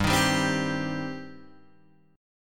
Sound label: G Suspended 4th Sharp 5th G, down, Guitar, Open G